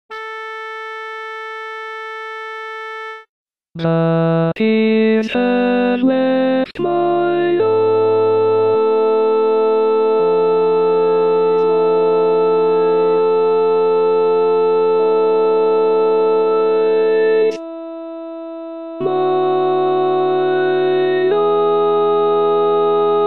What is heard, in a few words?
Tenor; teach tracks